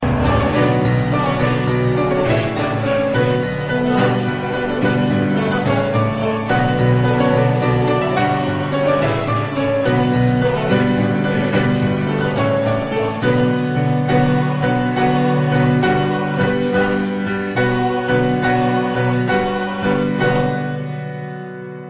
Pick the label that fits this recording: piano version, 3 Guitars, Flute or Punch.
piano version